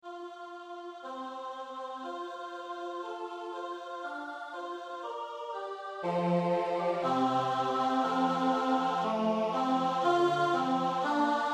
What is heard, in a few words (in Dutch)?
tenor; Motetten; Aichinger